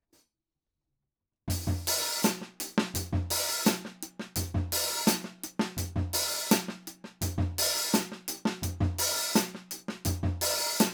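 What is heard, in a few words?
jungle overhead left, drums; film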